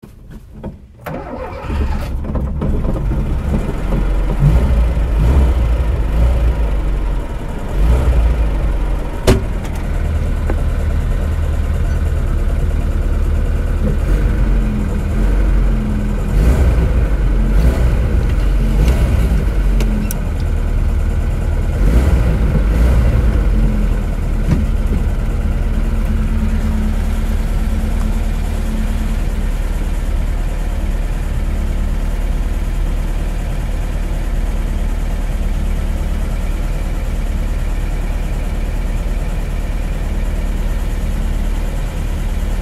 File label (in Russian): zvuki nivy